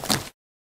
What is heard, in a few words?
branch jump; firefight